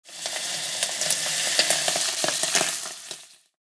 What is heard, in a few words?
deep frying